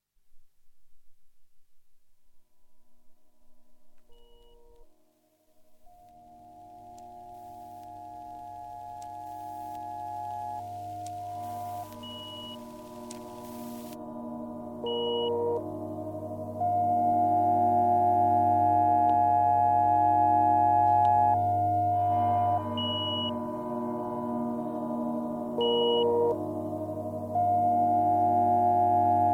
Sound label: zither & rain; rain is a pretty music